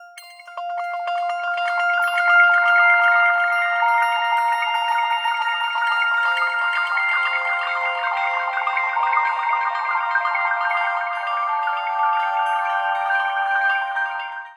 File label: Plucked Heaven CDFGA; Zero-G Ambiosis; Light